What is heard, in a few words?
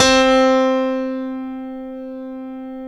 StereoGrand, Keyboards